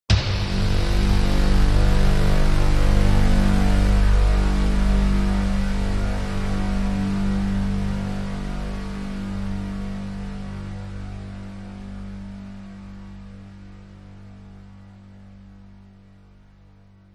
sound design cinematic drum hit into loud menacing buzzing synth; zapsplat